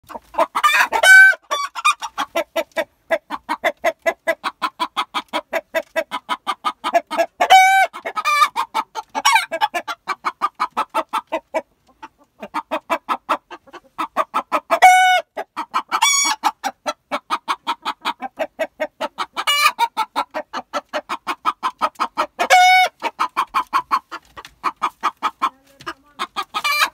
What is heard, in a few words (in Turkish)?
tavuk sesi tavuk gidaklamasi dogal